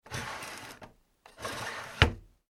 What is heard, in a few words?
household drawer wooden open close; Wooden ( Pine ) Drawer Opening And Closing ( Contains Some Light Noise Of Plastic Wrapping; Drawers; McKinney Sound